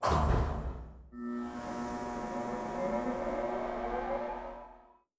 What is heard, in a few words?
fungoid spaceport cylinders; ambient; Stellaris